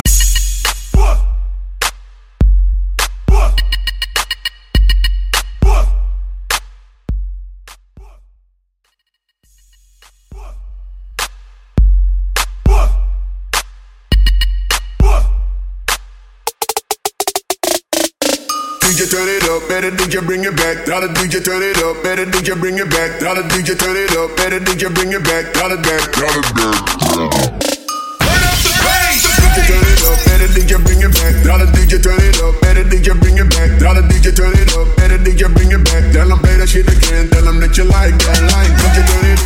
Bass House

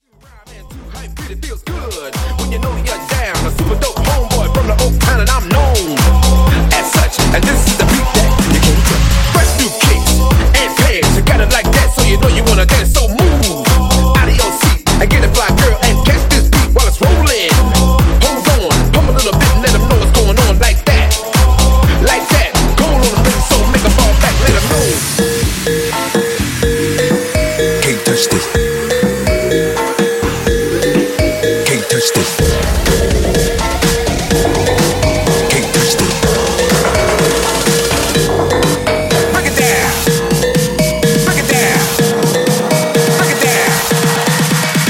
Mashup Mixshow 125 Bpm

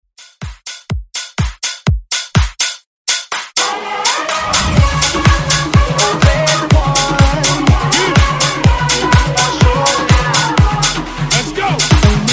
Latin House; Redrum

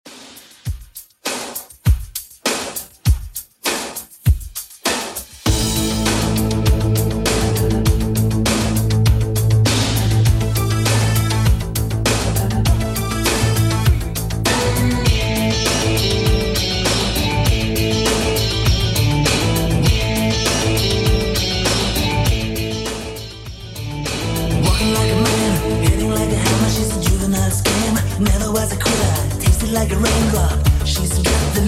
80s Redrum 100bpm

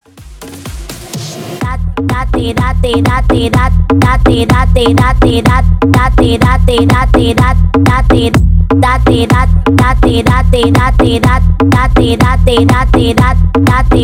Guaracha Transition Moombahton 125 115 BPM

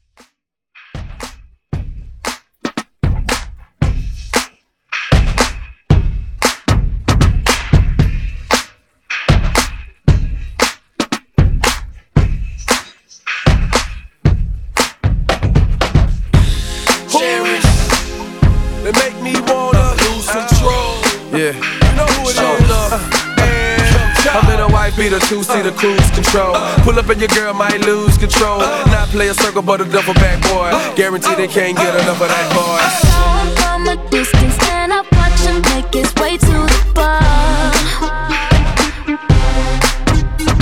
Re Drum 115 Bpm

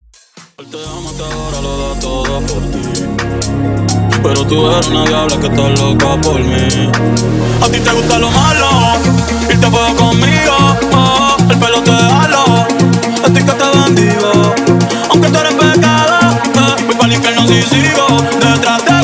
Latino House Mixshow 128 BPM

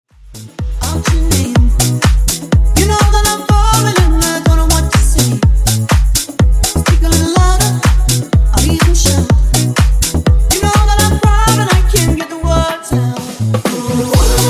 Disko Funk Remix 124Bpm

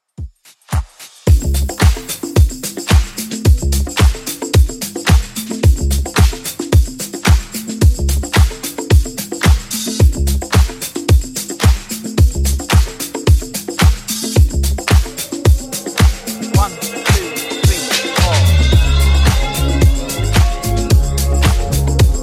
90s Dance Redrum 110bpm

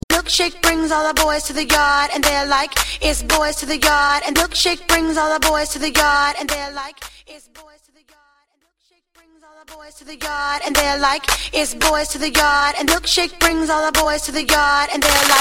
Moombahton, Clap A Pella; Mainstream VIP

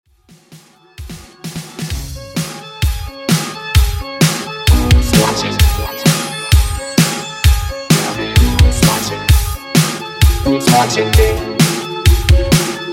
80s Halloween Redrum 130 Bp

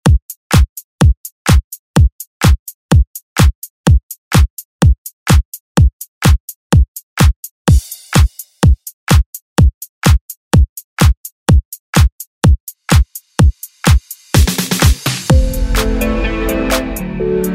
dance; Deejay Hit; Hit Dance Redrum

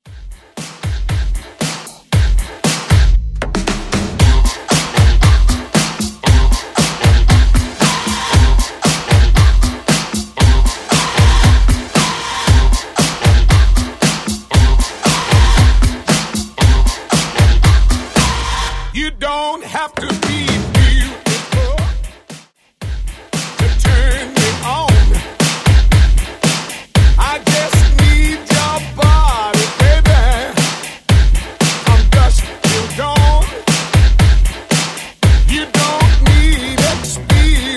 80s redrums

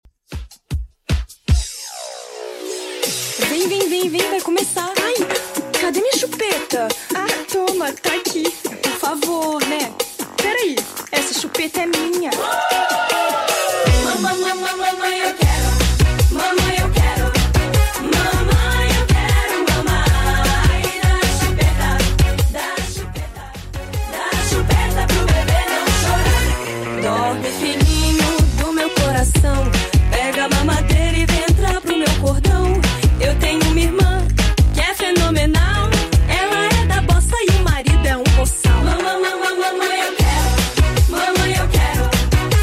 2ks Redrum 155bpm

